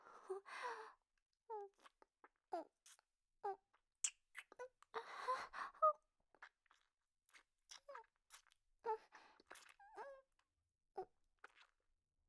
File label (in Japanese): ちゅ…ちゅ…, 左耳